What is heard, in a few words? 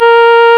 REED A#; Big Fish Audio; Synclav FM